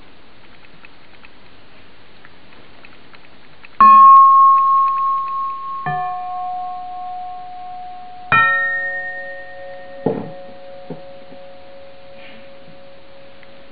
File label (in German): oberton